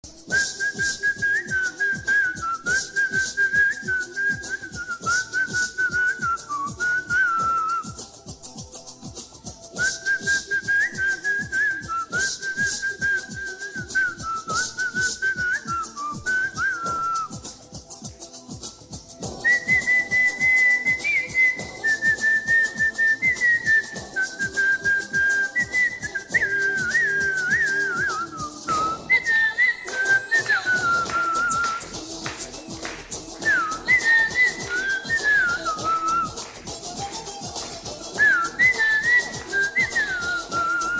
whistle sound

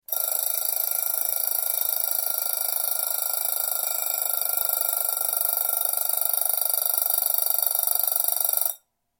old fashioned alarm clock

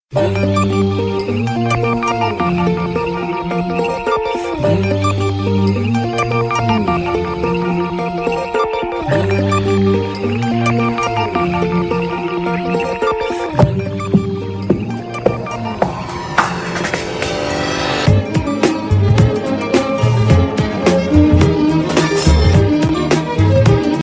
Indian instrumental

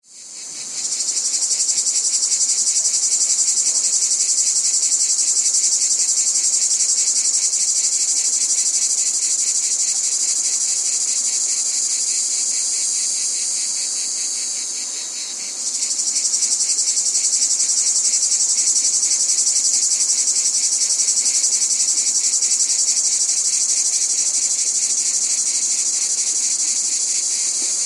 cicadas relaxing sounds of nature

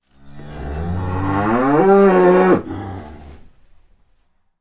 cow noises

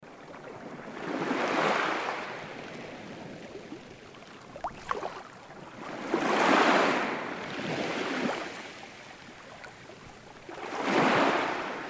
calming sea sounds

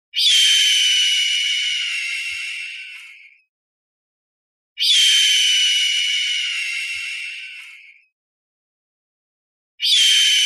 hawk screaming

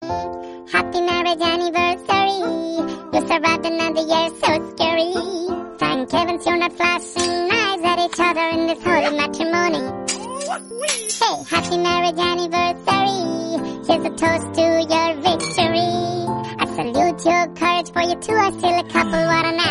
Funny Happy Wedding Anniversary Song

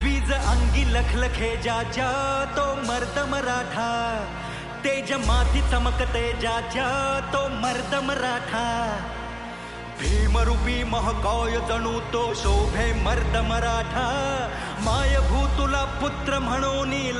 Marathi; Maratha mard maratha Swarajya

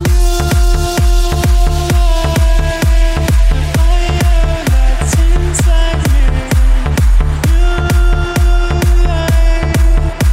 club tone

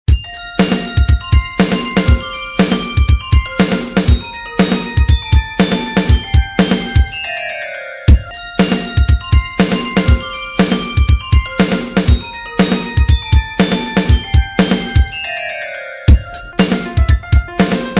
twinkle star remix

